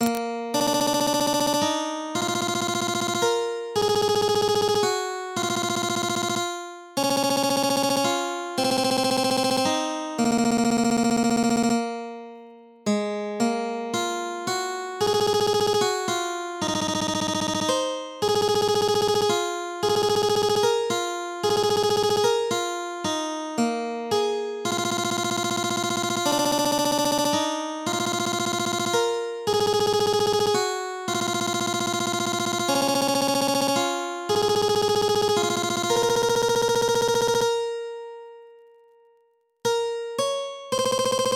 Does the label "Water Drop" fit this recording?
No